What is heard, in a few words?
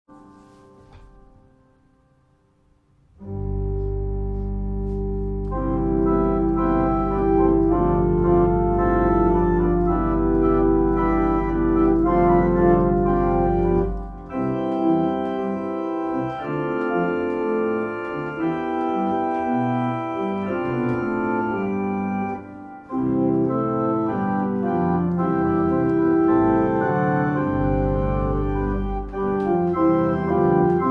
Wake awake for night is flying Hymn Prelude